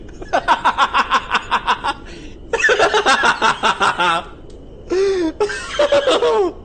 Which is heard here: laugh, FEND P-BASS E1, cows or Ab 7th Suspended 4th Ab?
laugh